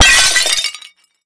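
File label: destruction pot break; Explosions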